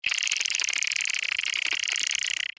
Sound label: Insect little monster chirping